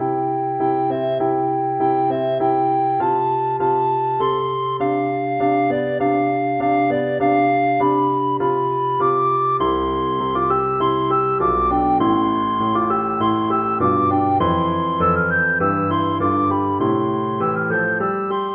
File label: sheep, recorder; bach